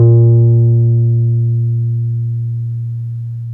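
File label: piano Wurly B2; Keyboards of The 60's and 70's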